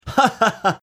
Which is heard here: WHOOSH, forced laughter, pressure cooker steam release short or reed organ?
forced laughter